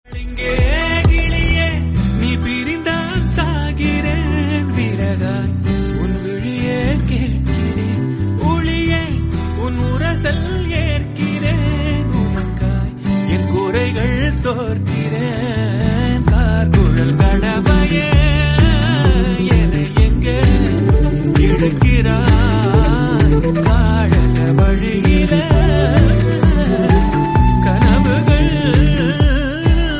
tamil

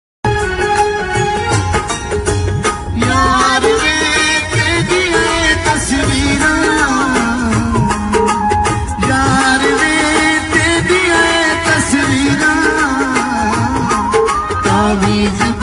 romantic punjabi

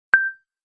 eq beep

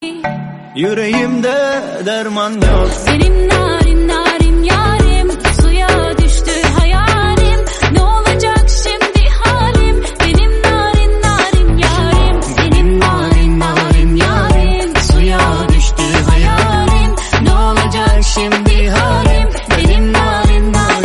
turkish mashup remix turkish english

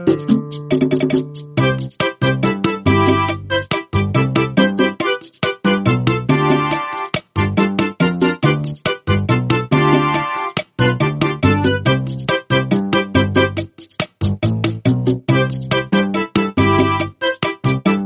theme 8 bit; legend of zelda

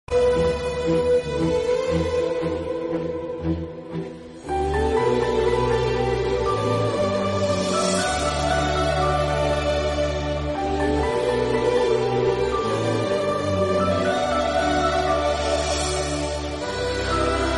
telugu bgm

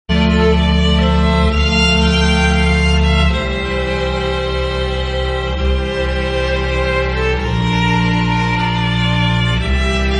violin

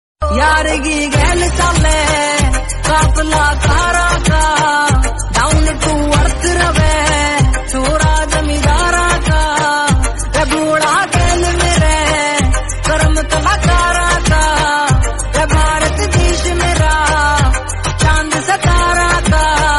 haryanvi romantic

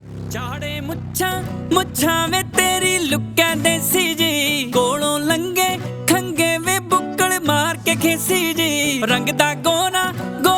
Guitar

